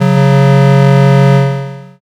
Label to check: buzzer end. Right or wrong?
Right